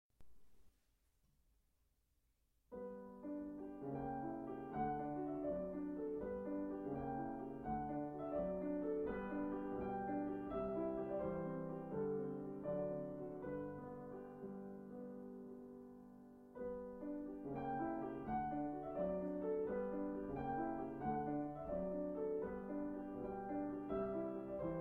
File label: Piano